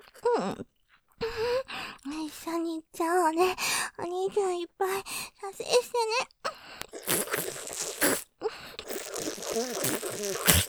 バキュームフェラ, ASMR; 射精おねだり; いっぱい射精してね♪バキュームフェラ絞り; ループで楽しむ！シェフの気まぐれ抜き抜きコース; モノラル

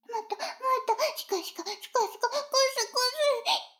シコシコ音声; 逆時間停止されて

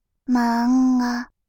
漫画, バイノーラル右, ASMR

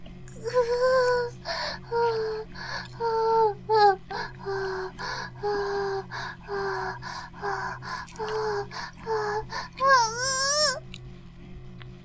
バイブ放置; イクたびにわけて

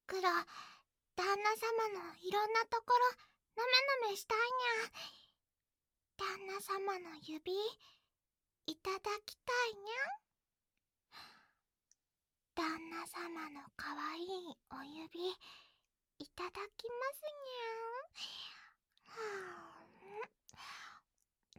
舐め舐めにゃん; もっといろんなところ

